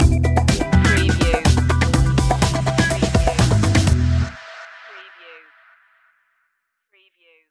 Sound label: funk stinger